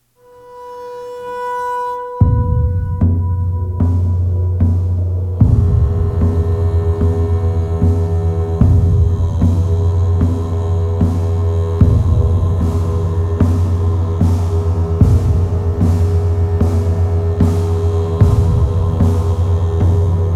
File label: epic trailer light version